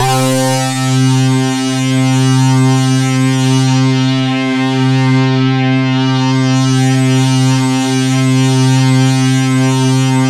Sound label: T8-SYNC, JV-1080